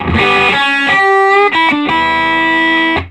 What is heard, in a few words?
SOLO E, Vintage Blues Guitar